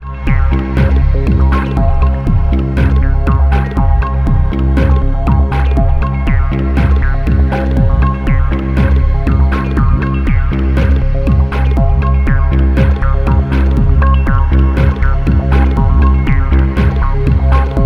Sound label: distorted drum